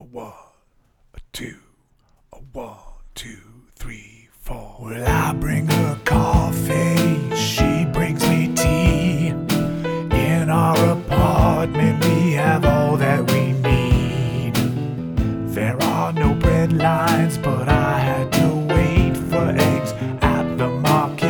Blues

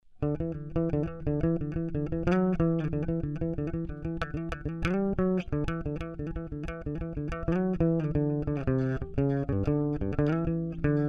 Bass Phrases

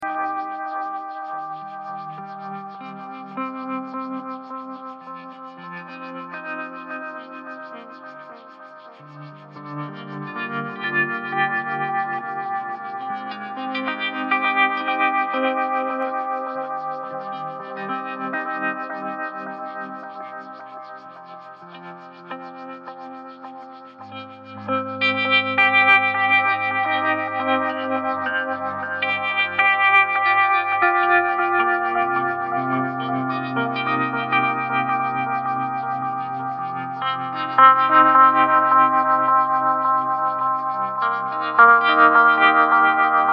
C 120 Elec GTR solo chords

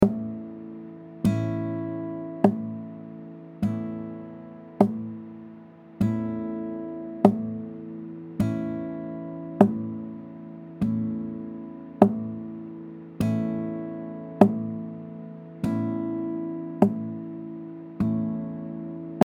A 100 Mellow Acoustic GTR

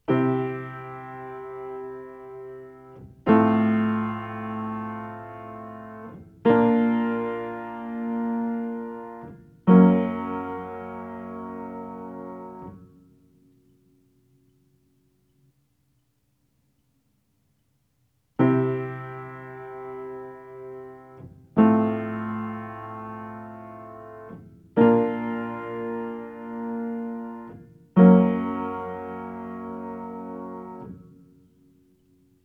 midnight piano samples

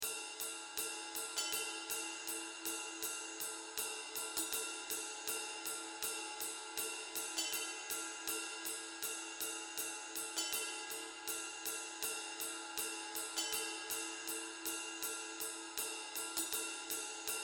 instrumental

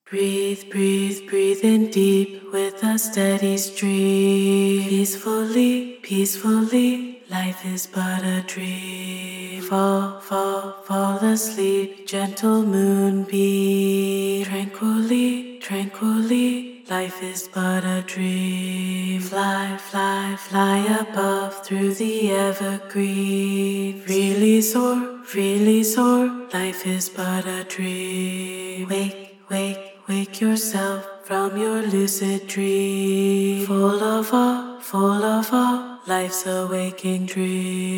Vocals

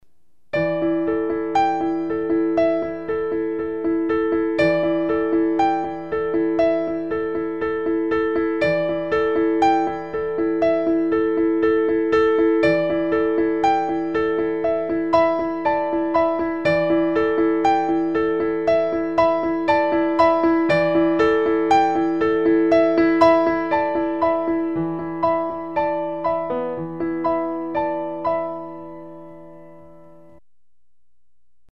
Piano

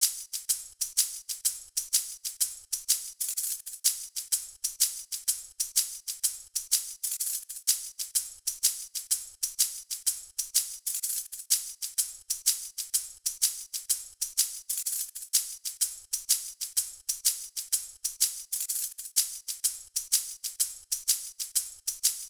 reggae

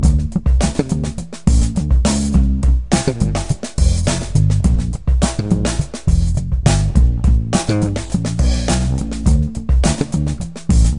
Funky Groove

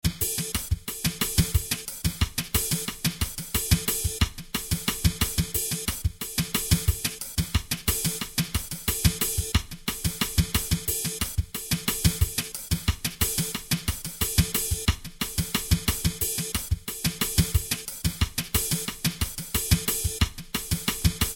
Jazz Drum Loop

